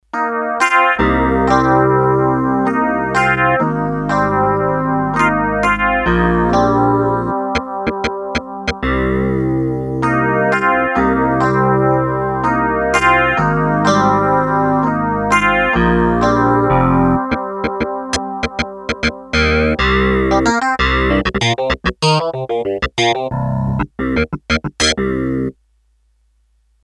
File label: Clav, Korg DS-8; Factory Patches